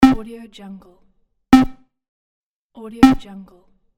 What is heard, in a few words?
checkout beep